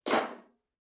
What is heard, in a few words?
Metal Footfall; soundscrate